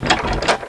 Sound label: door lock; doors